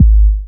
Dunk, Drums, Industrial; Sonic Foundry (Sony Creative Software)

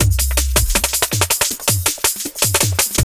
JUNGL 160, JUNGLE LOOP

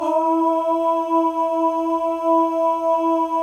male ohs; Spectrasonics; Symphony of Voices

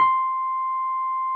Wurlitz EP C6; Sonic Reality - Vintage Timetraveler

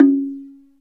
SLIT DRM C#4; SLIT DRM1; Heart of Africa

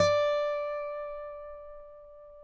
Finger D 5 R, Guitars; Spectrasonics; Hans Zimmer Guitars VOL-1

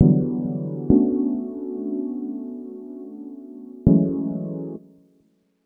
D&B Keys Loop; neuro

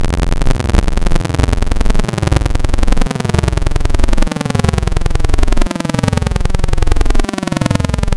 Spectral Gate Lin, wavetables; Architecture 32

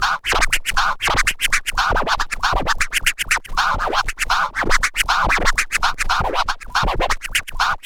SCRATCH SEQUENCES; SCRACTH; Megabass Remix! 2

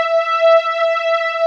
CHORUS E5, KORG, Analog; USB Soundscan vol.49; Analog Fantasies; AKAI

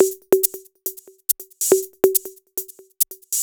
808 Loop, Drums Beats

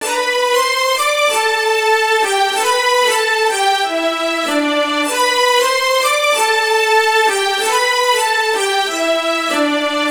String; Strings; Sonic Foundry (Sony Creative Software); Infinite Soul VOL-2

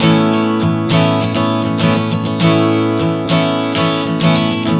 Strum 100 A, One Chord Strumming 100 bpm

